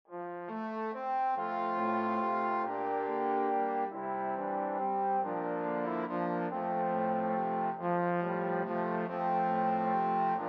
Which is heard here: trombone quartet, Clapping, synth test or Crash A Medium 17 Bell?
trombone quartet